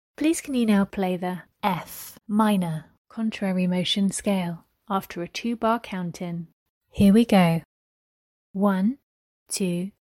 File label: f min contmotion scale